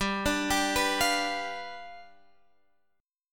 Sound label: G 7th G down Guitar Standard; G7 arpeggiated; JGuitar